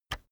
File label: keyboard external enter push heavy